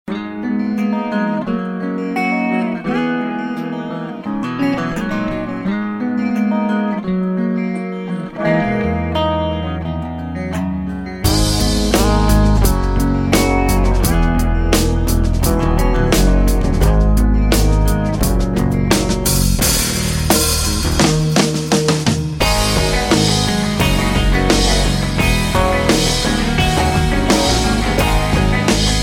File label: Rock